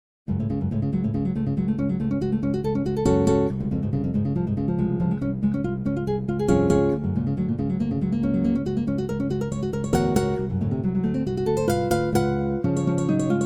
Moonlight Guitar

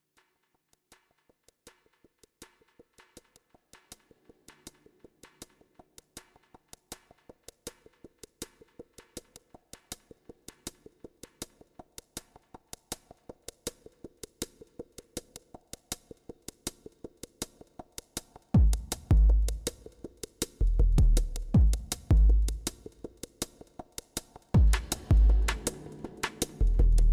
Drum Train

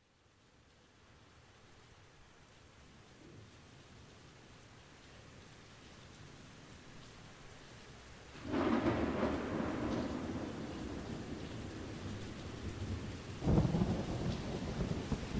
South Americas Ambience Waves

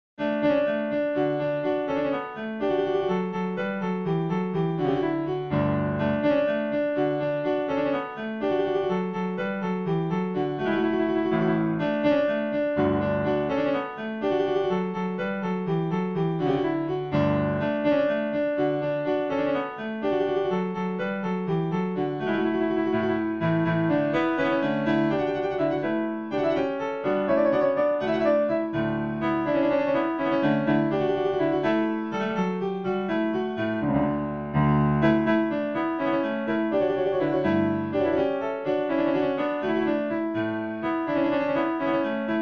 Piano Fanfare; die Piano Fanfare würde gut ins Mittelalter passen (50 Sekunden

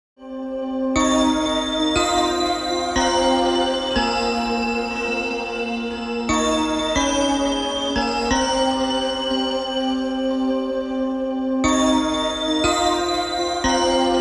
Spheric String Bells

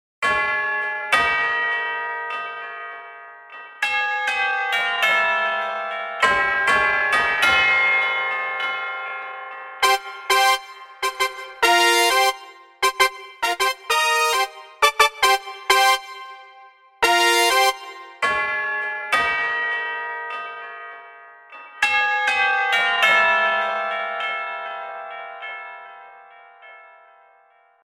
Tubebell Fanfare

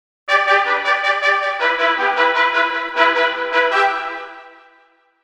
Pure Trumpets Fanfare

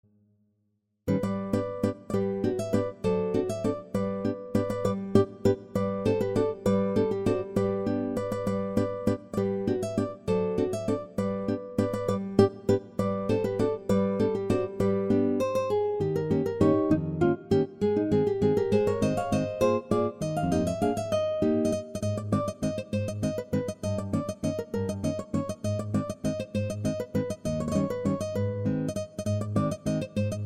sentimental Guitar Waltz

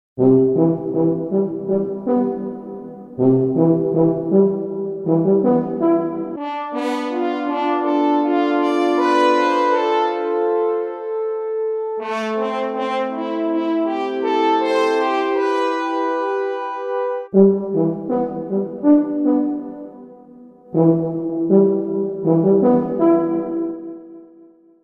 Tube Trumpets Fanfare

